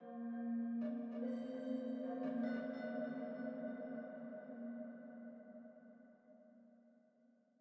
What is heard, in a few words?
Env Field Night Cave